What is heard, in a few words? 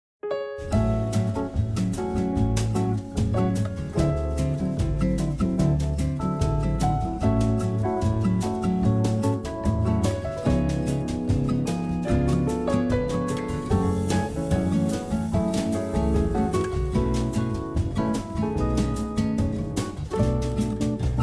Springtime Bossa; Pictopix